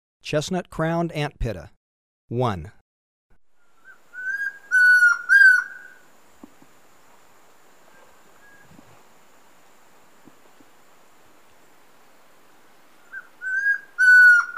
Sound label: Chestnut-crowned Antpitta, Birds of Ecuador; S. America